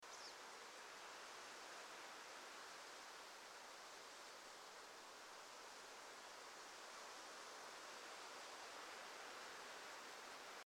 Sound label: bgm